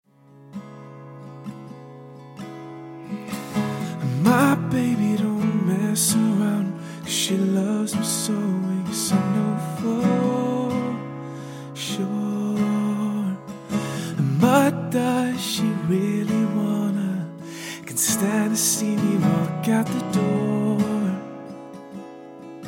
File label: Guitar Vocalist